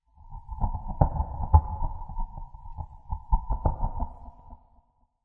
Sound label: Low Wobbling, Wobbling Dark Atmospheric Sound; Monsters; Horror